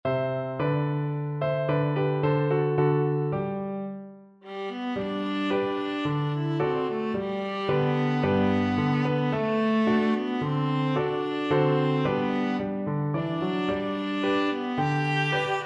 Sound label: o alte, viola